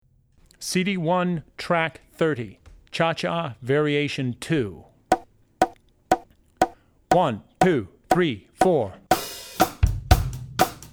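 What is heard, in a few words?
Cha Cha (Variation 2), Drum Tracks; Drummer's Bible Tracks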